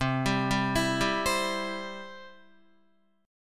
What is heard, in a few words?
C Major, Guitar; arpeggiated; JGuitar